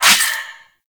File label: Shadow Melee Swipe; Warframe; Duviri